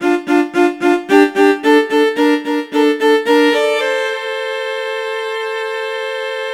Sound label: Violin; Sonic Foundry (Sony Creative Software) - Rondo Brothers Trip-Hop Loops & Samples; Apologists